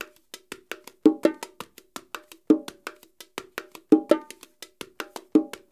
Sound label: PERCUSSION LOOPS; Sampleheads; NYC Percussionworks; ER LOOPS 84